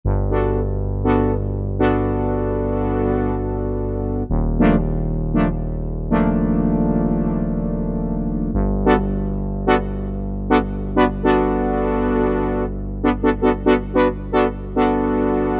Prophet Horn, synth

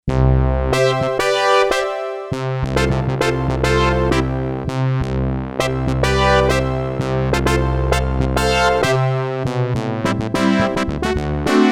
Brastrings, Yamaha DX11; Factory Performance Voices

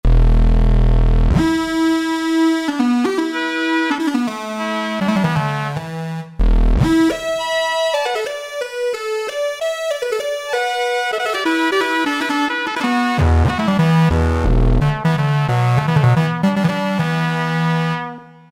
led, E-mu PK-6

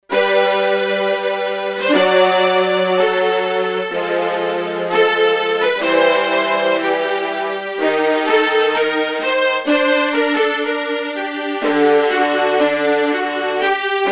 Arco Violas; Factory Presets; E-mu Proteus-2

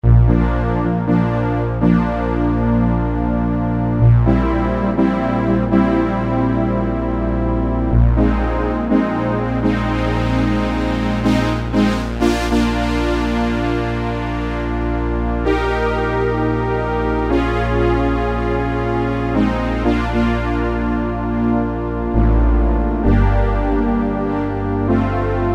Pad

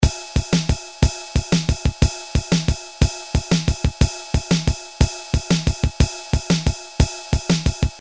Shuffle, Boss DR-220A Preset Patterns

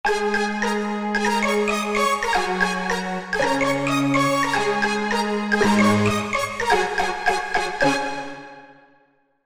2 voices; Korg MSC-12S Synth 3

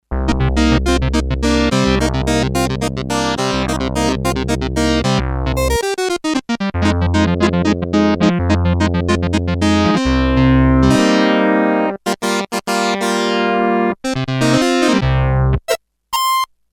Synth clav, Sequential Circuits Six-Trak; Factory Programs

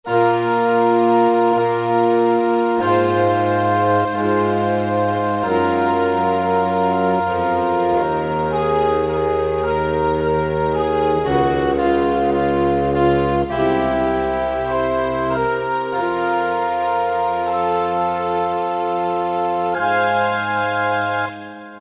Orchestral

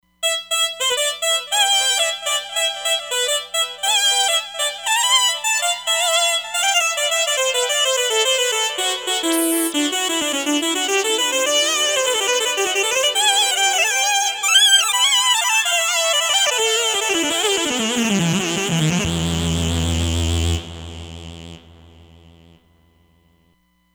Classic Lead, Roland Fantom XR

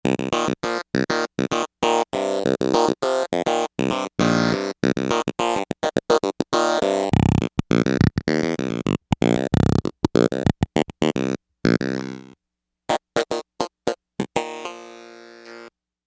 Clav

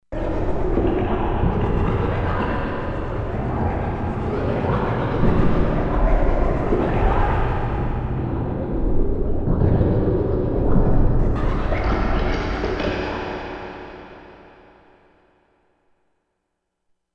Dark Space, Korg MSC-16S; Environment

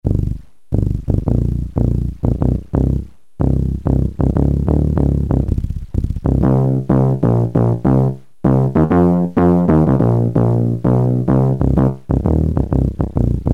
FAT BASS, Casio CZ-1000

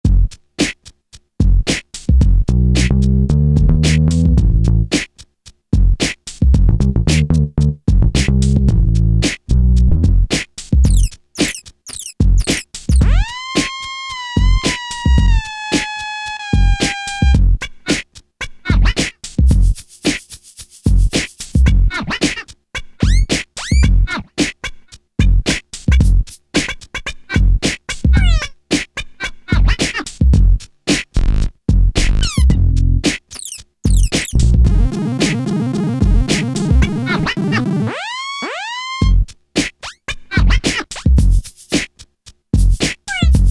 Fat Funk Fun; Alesis Rap Techno Dance

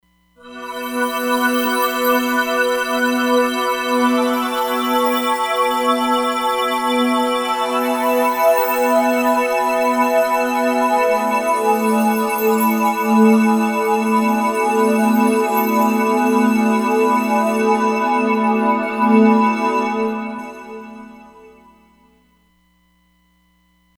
Roland Fantom XR; Saturn Rings

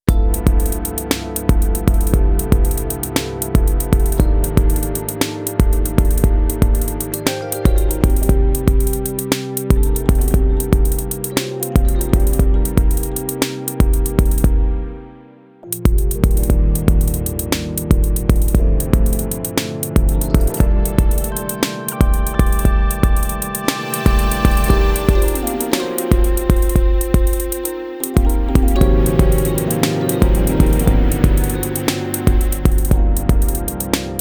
Deep Jazz, Korg Radias-R

